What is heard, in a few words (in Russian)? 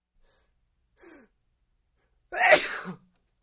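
чихание человек чихает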